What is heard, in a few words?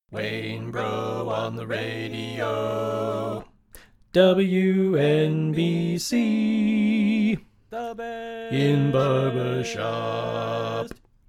Barbershop Bass Left; Pennsylvania Groups